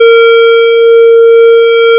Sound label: fm voice single2; FFTimbre